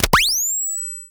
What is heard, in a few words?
switch on sound